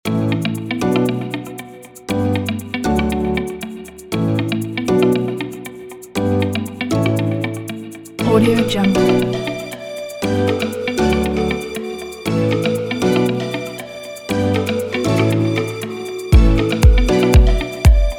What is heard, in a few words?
happy